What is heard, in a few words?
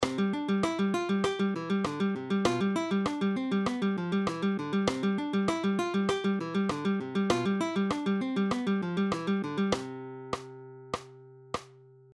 Alternating bass Fingerpicking, Guitar Plucking